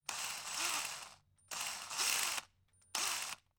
18V Cordless Drill High Pitch; Tools

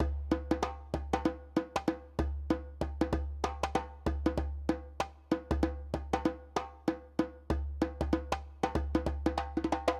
TOPS, viral hiphop

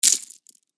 other player cash out

